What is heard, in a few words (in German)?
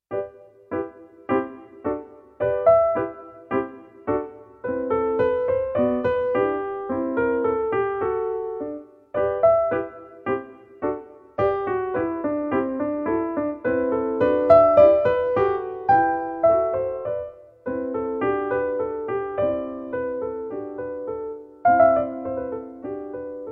Vier kleine Klavierstücke